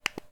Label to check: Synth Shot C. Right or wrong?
Wrong